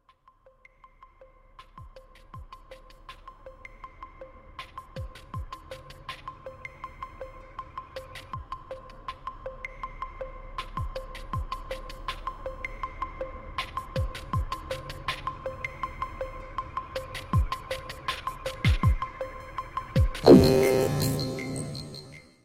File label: timeout